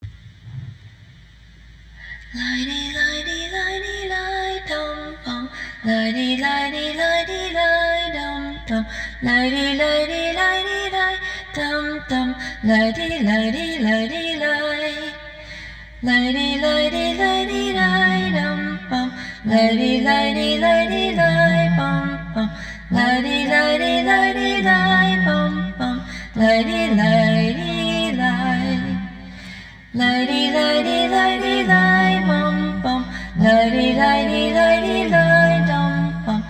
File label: Celtic sounding lullaby